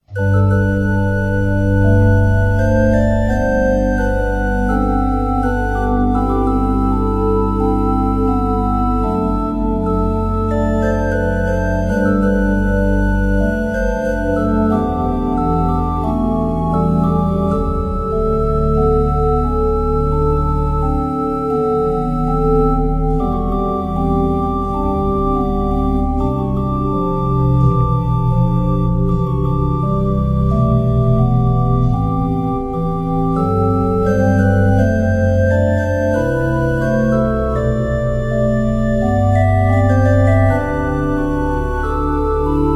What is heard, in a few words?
Bach Chorale